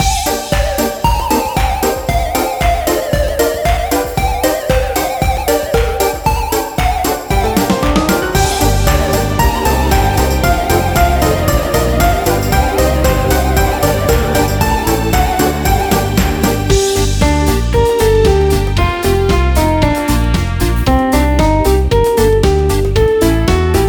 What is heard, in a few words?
karaoke